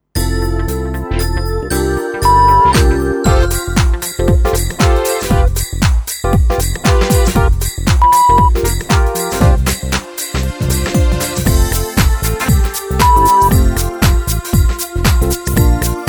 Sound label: XMas House